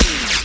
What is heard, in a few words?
bolt, weapons marteau